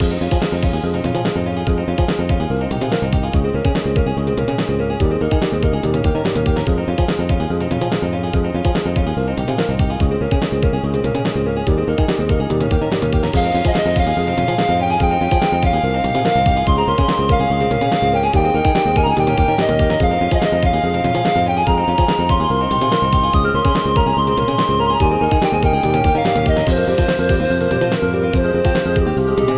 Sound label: upbeat